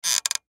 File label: Creak Squeak; Cartoon